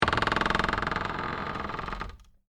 Door Creak Wood, Foley, Door, Creak, Wood

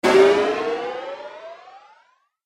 Cartoon Rising Hit; Cartoon Sound Design

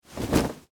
Wool Coat Shake, Clothes; Foley; Dressing; Human

